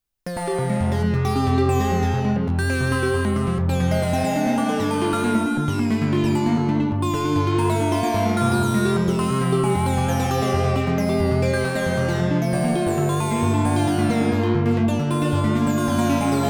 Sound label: ARP HARPIST